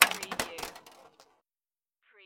Pinball sound, clank with ball travel

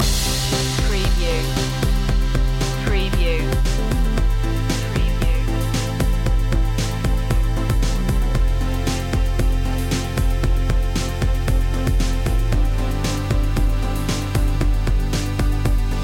future technology loop

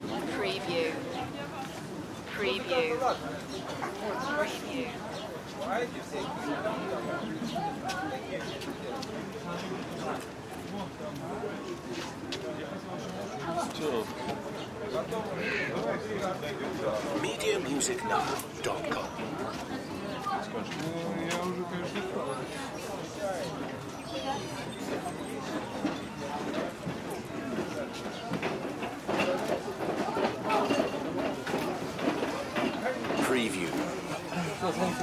flea market moscow

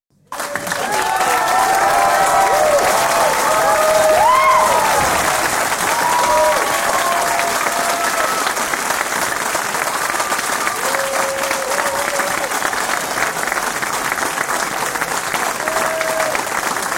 Big Crowd Audience Applause; beanstalk audio

